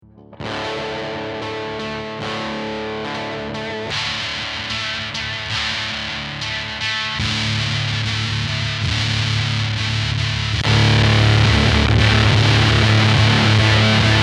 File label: crusher; deathbyaudio; waveformer